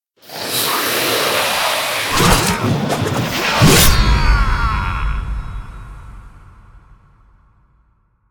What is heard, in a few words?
multiplier Strike; conan